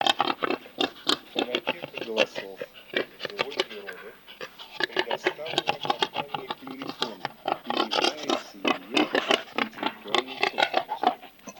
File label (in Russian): Кабан Cinghiale